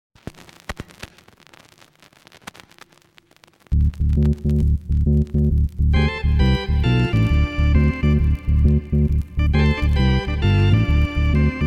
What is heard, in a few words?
keys; ROLAND Library Preview CD SV-SP70-01